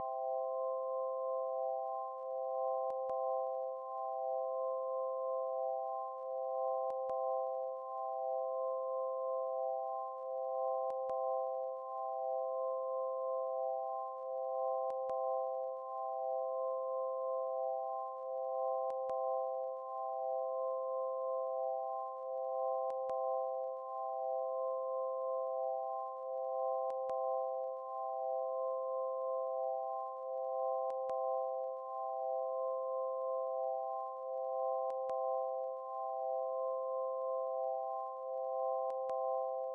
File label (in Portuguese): chuva